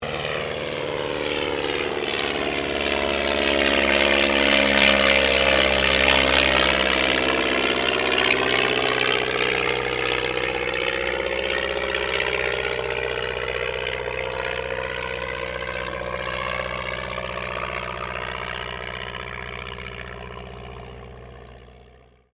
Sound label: AIRCRAFT DH82A DEHAVILAND TIGERMOTH PASS